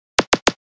ticks

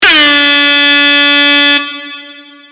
air horn

